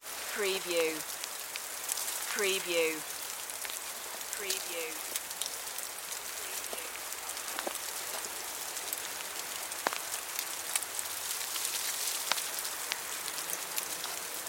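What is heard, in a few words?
Ice Snow Falling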